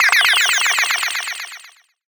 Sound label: Sci-Fi Lasers - FX Sweeps; Antidote Advent